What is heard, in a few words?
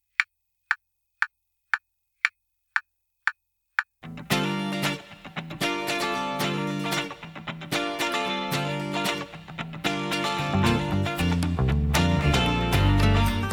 no drum; split by lalalai